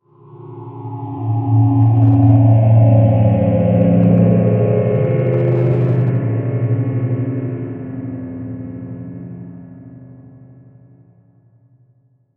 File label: Magnetic, Ray, Beam, Heavy, Science Fiction, 5.1